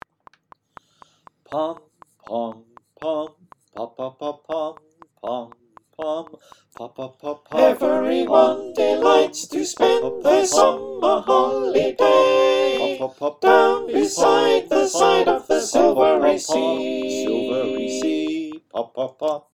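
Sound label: soprano alto tenor bass, choir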